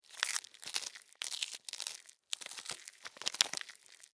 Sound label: Extermination, Fly, Paper, Movement, Sticky, Insect, Bug; Sound Effects:Animals:Insects; Blastwave